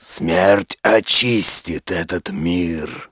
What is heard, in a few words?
hero